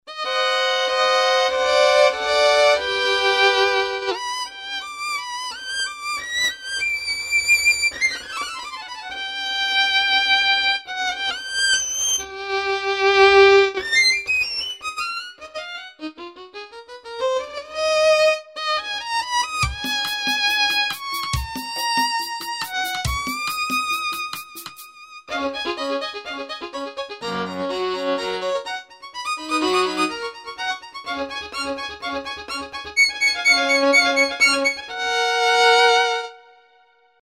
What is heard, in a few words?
violin full